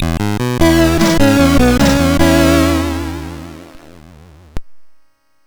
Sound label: sid MOS6581R4